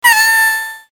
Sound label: catta howl